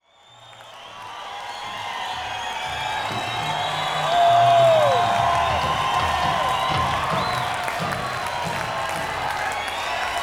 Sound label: Crowd